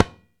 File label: TABLA KA; ILIO; Synclavier Percussion 2; World & Orchestral